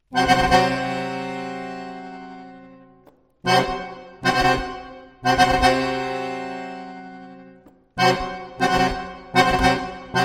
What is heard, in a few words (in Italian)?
fisarmonica accordion; agitazione del mantice bellows shake; gubajdulina sonata et exspecto 2